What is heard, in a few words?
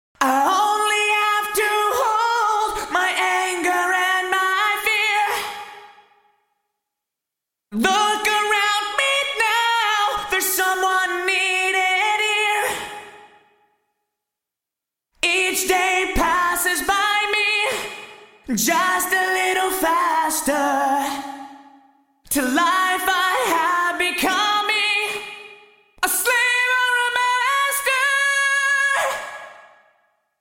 Vocals AMS RMX16 Ambience Vintage Digital